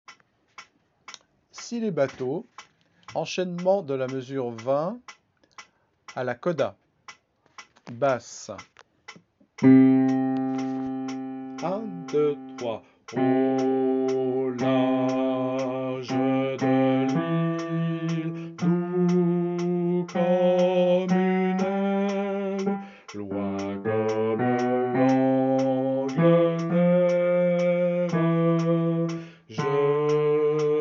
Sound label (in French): basse; coda